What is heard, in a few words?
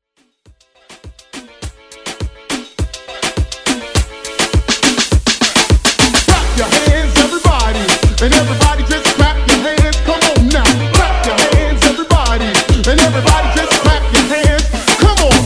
Moombah Hype Edit